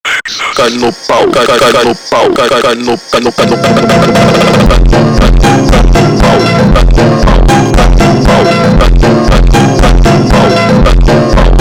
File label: slowed; funk